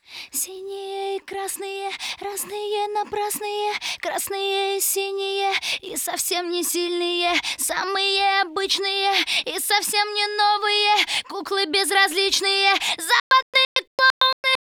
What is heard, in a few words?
acapellas